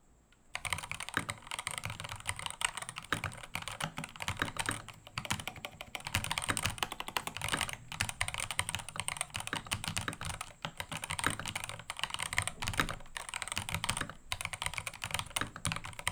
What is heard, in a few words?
typing; skyloong gk980